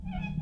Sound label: Rusty Screetch